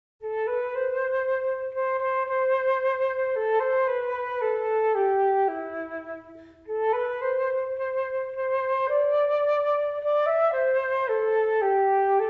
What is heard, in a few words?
Cajun Waltz